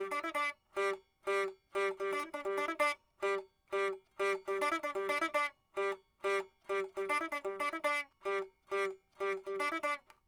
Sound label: sitar